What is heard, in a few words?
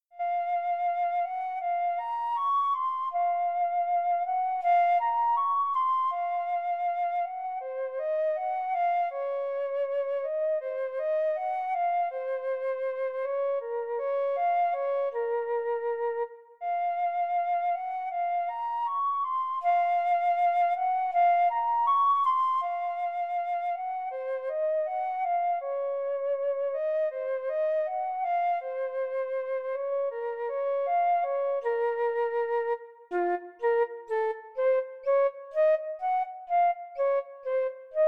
Soliloquy for Flute